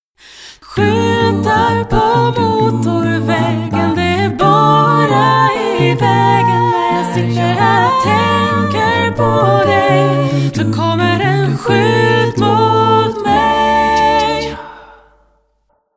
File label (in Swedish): musik